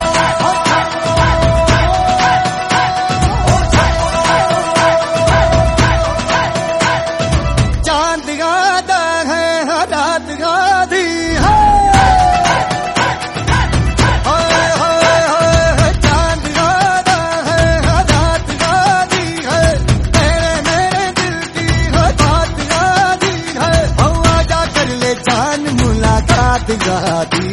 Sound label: bollywood song tone ringtone